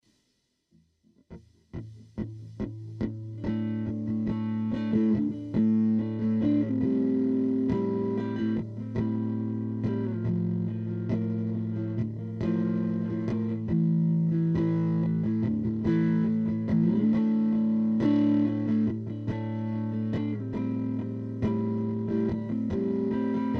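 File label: D 140 guitar groove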